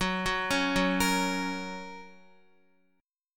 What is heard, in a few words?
F sharp Major, Guitar; arpeggiated; JGuitar